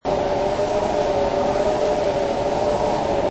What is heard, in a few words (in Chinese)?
台风; 汽车音效